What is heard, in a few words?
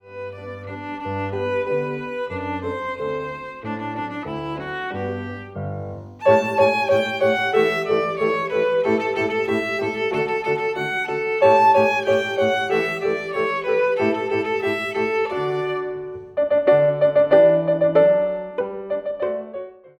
VIOLIN; Trinity College London violin exams for 2016-2019; Dawe: Bohemia (Polka)